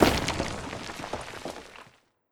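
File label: Golem Hit Explosion; Golem Trans Hit Expl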